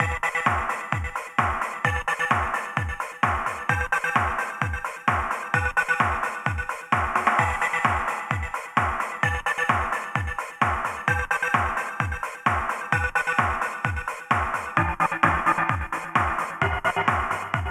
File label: Desert Mayhem, LoFi; action